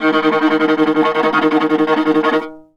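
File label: viola tremolo D#2; Orchestral; DirectWave; Varazdin Orchestral; Viola II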